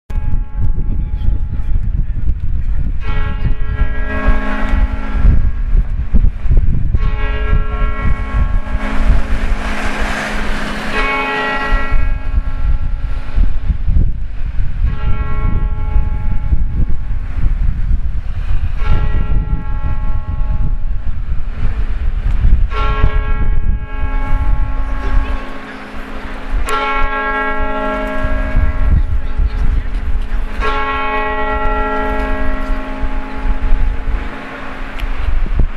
bells of st paul's cathedral

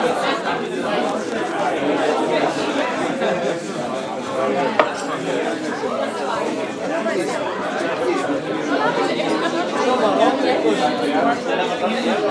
pub glasgow

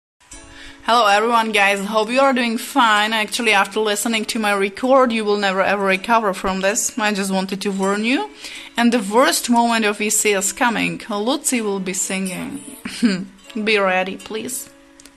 Singing

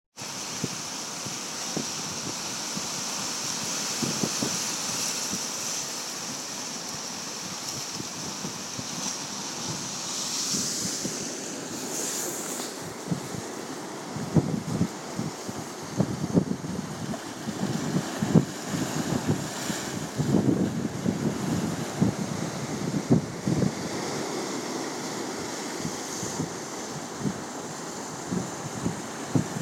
Waves rolling ashore, Ocean Atlantic Islands; Árainn Mhór, Donegal

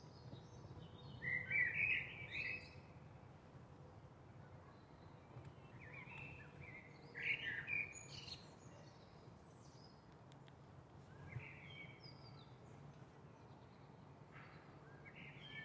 beautiful birdsong and giggling in my garden

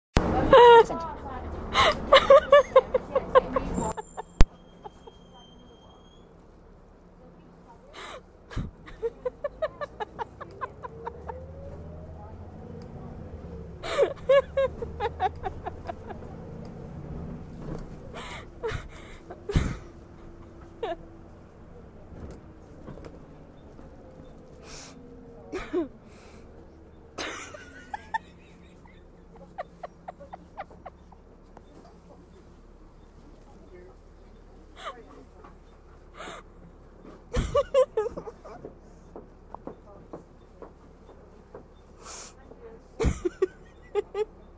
bus laughter

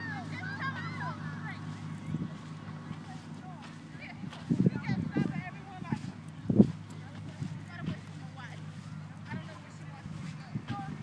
ambience beach leaving

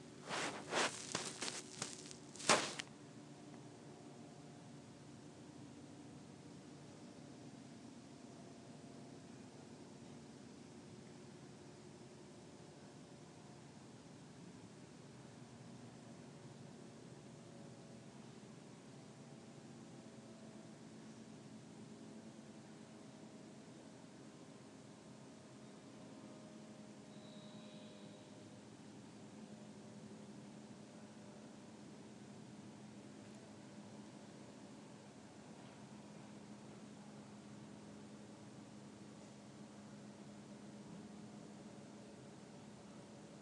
drones

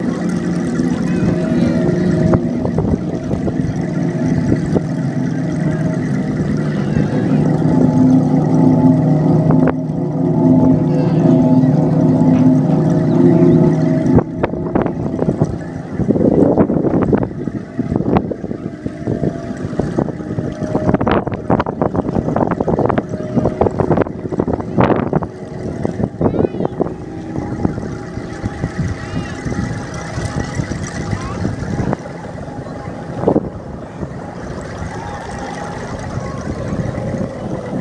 wind orchestra

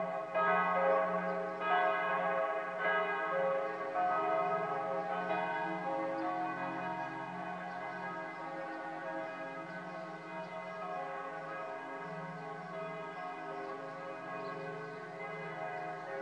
church bells ringing on sunday

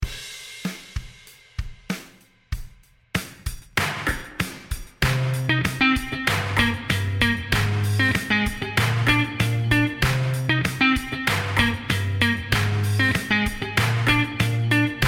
karaoke version

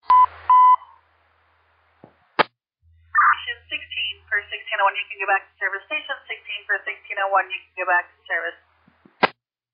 alert tones page received

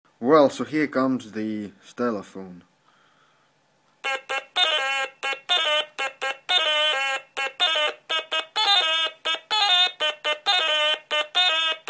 attempts on a stylophone

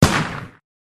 gunshot

